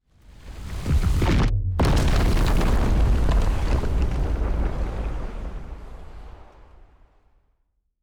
dark matter eruption; Stellaris; strange worlds

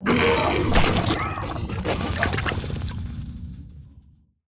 sinister slurping; Stellaris; strange worlds

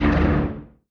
distance laser hit; weapons; Stellaris

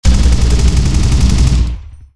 auto cannon fire large; weapons; Stellaris

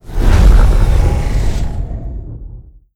sfx cruiser moving out; Stellaris; nemesis

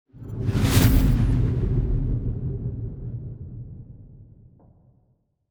battleship move; aquatics

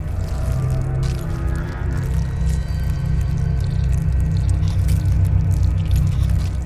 kaiju egg destroyed loop; biogenesis

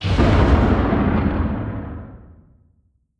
distance xl arc emitter fire; weapons; Stellaris

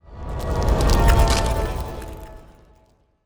pro ship transport move; biogenesis

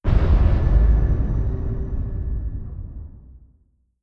distance perdition beam hit; apocalypse

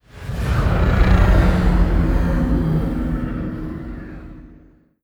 sfx juggernaut moving out; Stellaris; nemesis

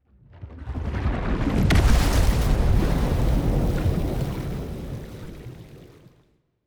colossus planet hit; aquatics

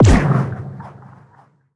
scavenger bot missile; Stellaris; distant stars

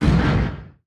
distance torpedo fire; weapons; Stellaris

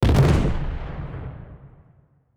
kaiju titan hit explosion flash; Stellaris; biogenesis; weapons

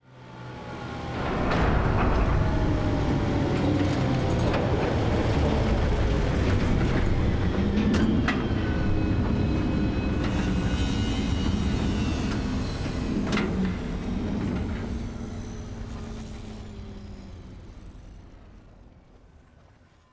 ship colossus closing; Stellaris; toxoids; ships

